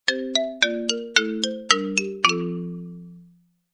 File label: Xylophone